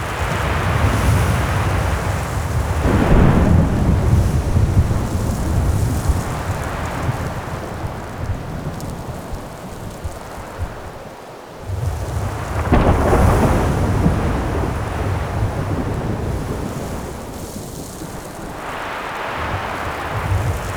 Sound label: Exterior Opening Wind Loop; Ambience; Props; Warframe; Entrati